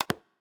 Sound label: soda lock; LG X power 3